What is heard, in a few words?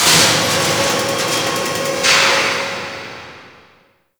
Ocean Conveyor; Warframe; Grineer Ocean